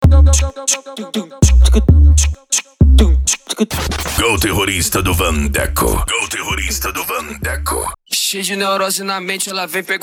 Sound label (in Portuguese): dance e funk mega bass